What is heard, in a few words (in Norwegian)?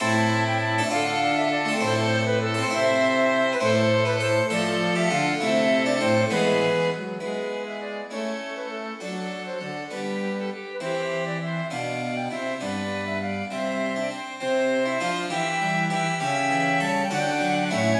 Gigue for fløyte, fiolin, cello og cembalo